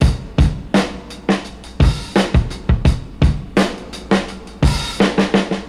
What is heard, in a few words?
DRUMS